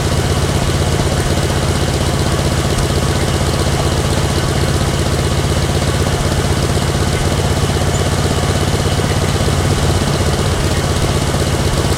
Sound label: sherman m4a1 engine rpm 66 load; engines; War Thunder